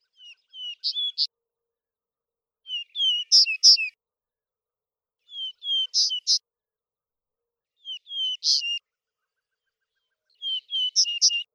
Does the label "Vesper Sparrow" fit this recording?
Yes